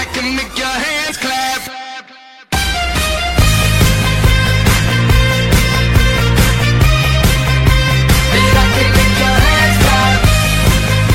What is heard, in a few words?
remix tiktok; handclap